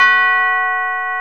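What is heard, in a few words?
BELL F#3, percussion; AKAI S-Series CD-ROM Sound Library VOL-8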